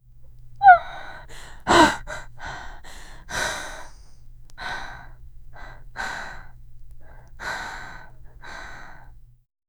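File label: sharp quiet intake of breath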